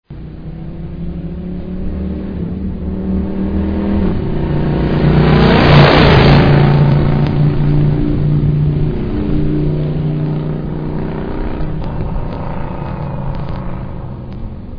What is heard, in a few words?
driveby, suzuki vs1400; VS 1400 GLP Intruder model US 2002; zvuky různých laďáků; nové laďáky : Cobra